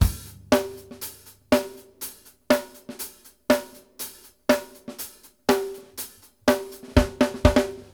HANDS, drum works; Sampleheads; New York City Drumworks VOL-1

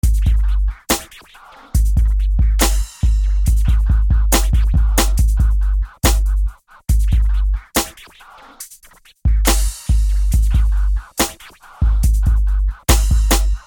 Beat; Sonic Foundry (Sony Creative Software); Infinite Soul VOL-1; Beats

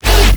Yuy F, bass

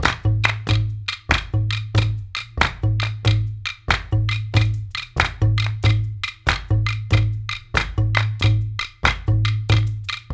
World Dance; OZ!

